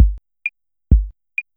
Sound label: 808 kick snare